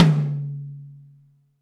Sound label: DM5 Tom Hi, Alesis DM5 drum machine